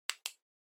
Portable MD Button

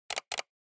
PC Mouse

